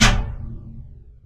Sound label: STOVE HIT; Rarefaction; Poke in The Ear With A Sharp Stick